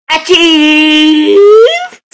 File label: jumpscare; miles tails prower and amy rose